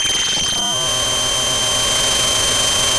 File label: Sound of a cat meowing